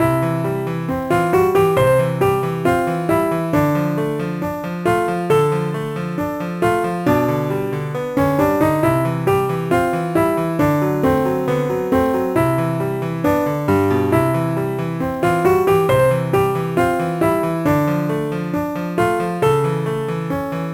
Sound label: BGM PIANO ALL